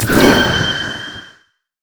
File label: trinket orb; LoL; items